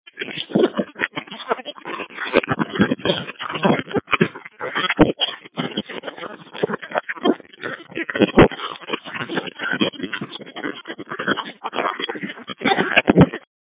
Encryption on TDMA - This is what encryption sounds like on a radio capable of receiving T; Phase-II